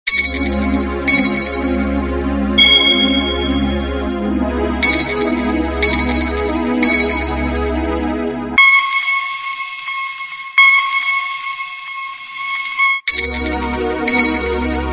Space Alarm; Pantech; P2030 Breeze 3; Alert Tones

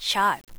Sharp; i-mobile 5511 3G; English

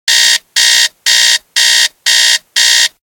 music alarm; Samsung GT i7680; alarms

